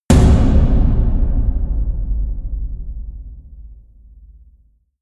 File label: huge tom hits; music elements; percussion